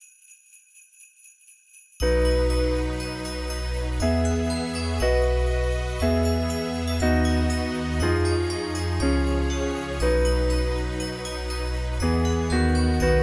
Jingle

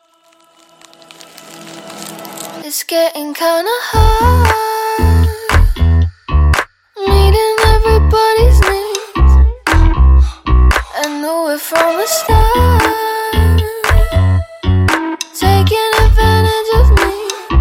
music

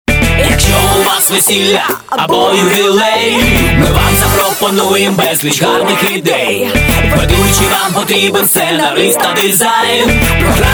Music song

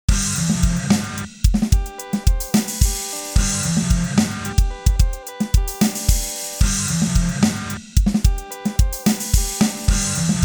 fun

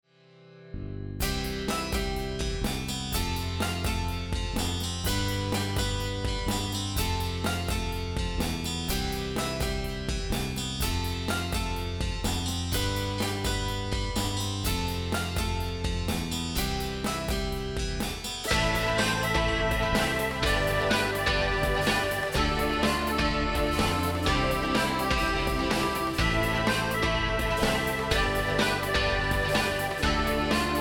Music ad

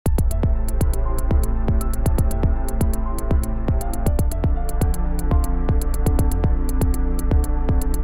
bit

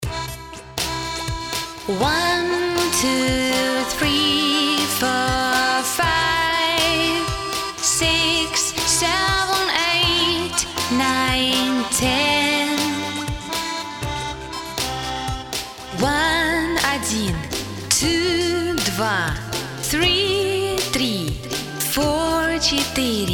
numbers song; Stereo Out

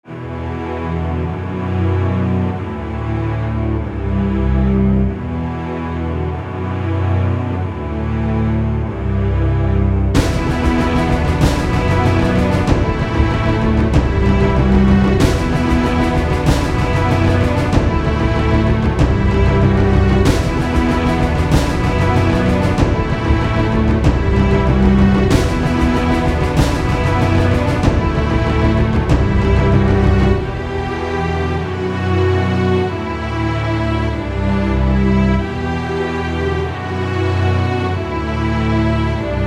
epic orcestral theme

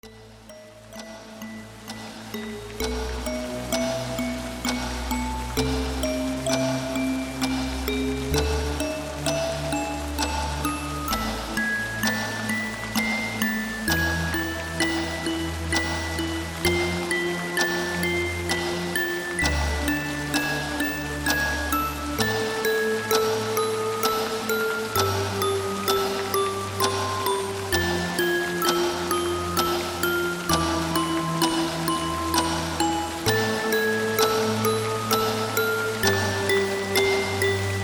Menu theme

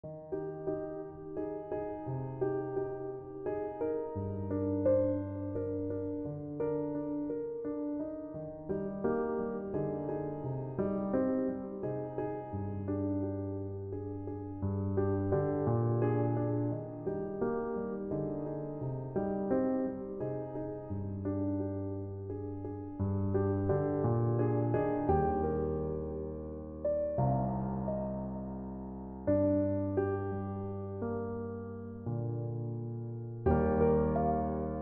piano

